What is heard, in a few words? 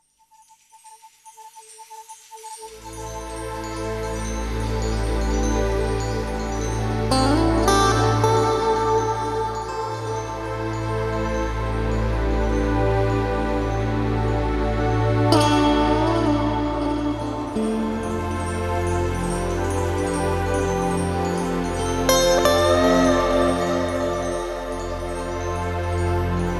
(Guitar Melody); Vaporwave